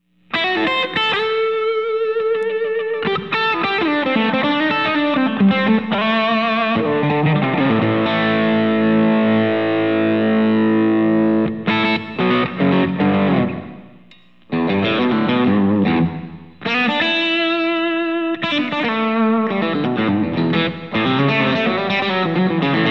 BD2 Half Gain

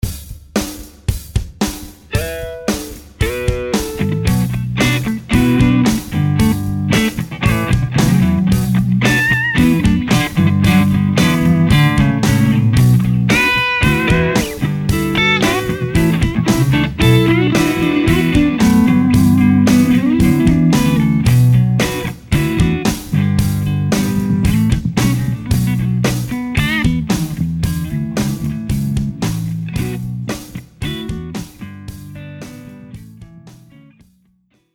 Groove

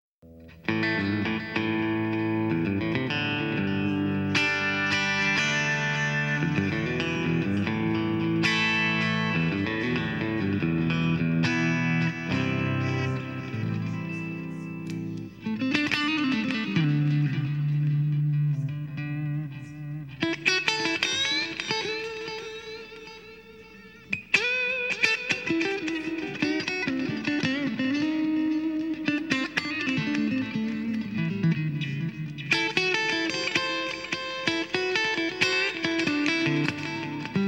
DL4 Delay Mod

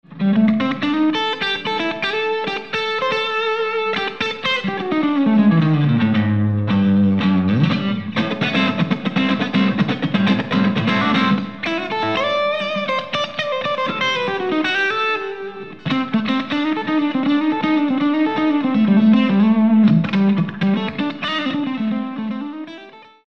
SD1 Half gain

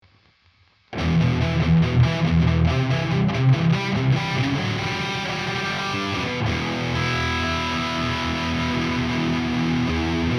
CE-3 Dirt

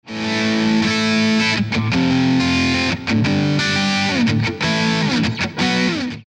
Metal Dirt, Cyber Deluxe

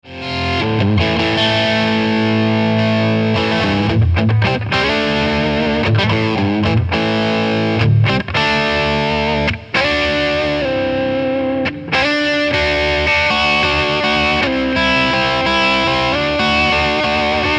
Overdrive, Cyber Deluxe

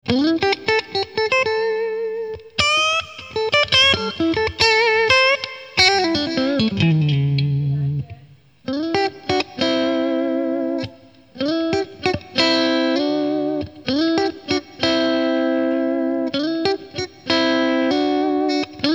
Clean, Cyber Deluxe

